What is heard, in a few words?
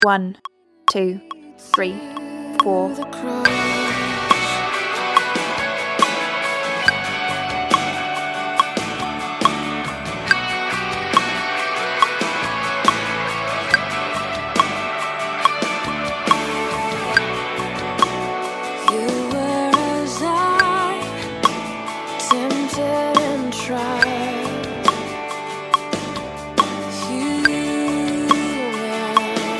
bass track